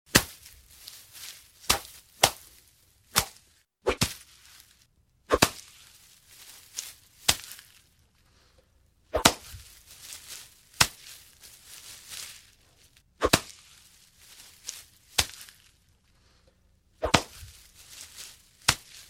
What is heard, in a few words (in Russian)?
Machete